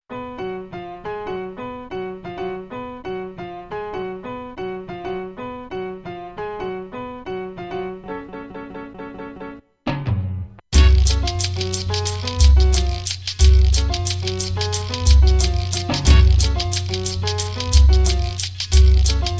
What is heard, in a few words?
beat